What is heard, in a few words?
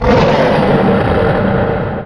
Attack